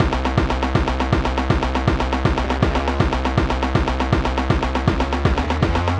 Beats Idle Loop; Anger Foot

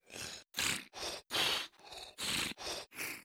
big fly snore loop

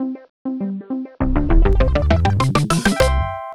JINGLE BONUS

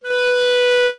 Clarinet tone; game rip; Lego My Style Preschool

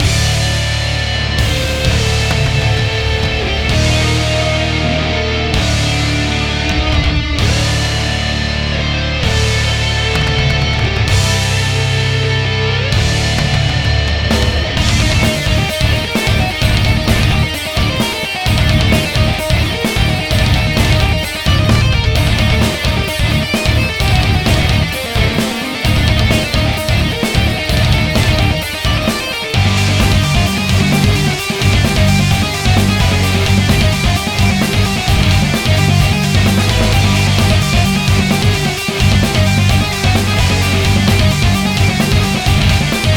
Foundry Boss

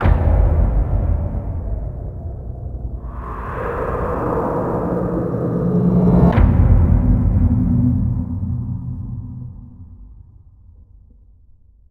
Drug Lab Cutscene Explosion

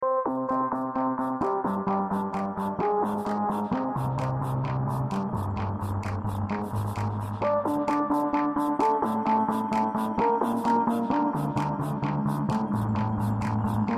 Space; Musi Space V1

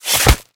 Fight Attack Hornes; mighty party 2017 gamerip pc ios android

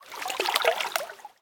lake, Ice Fields

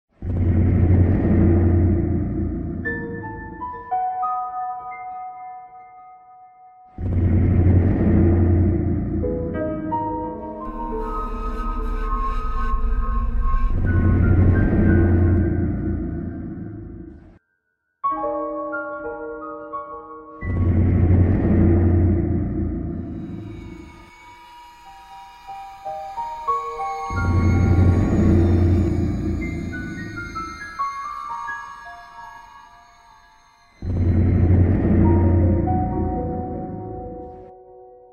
Music Cellar

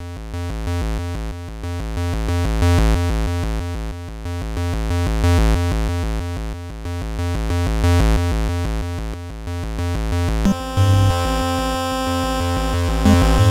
Suspense; Knights of Tartarus

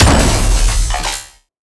Revolver Charged; Robobeat

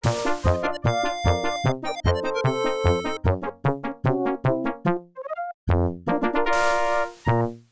Backgammon Win; mario s game gallery dos

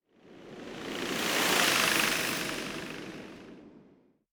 Fly By

